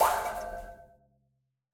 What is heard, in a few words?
Lab Giant robot Shot; Lab Giantrobot Shot - Marker